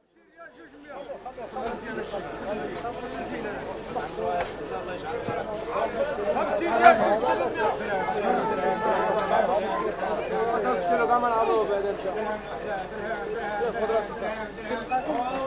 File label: Morocco: Rabat, souk (market); Stores And Mall; BBC